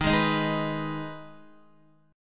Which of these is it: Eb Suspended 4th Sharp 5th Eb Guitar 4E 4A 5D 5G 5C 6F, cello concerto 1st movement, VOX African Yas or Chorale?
Eb Suspended 4th Sharp 5th Eb Guitar 4E 4A 5D 5G 5C 6F